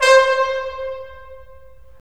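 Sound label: brass; Roland - LA Composer vol. 3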